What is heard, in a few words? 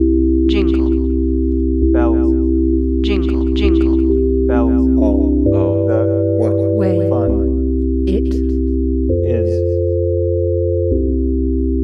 vocal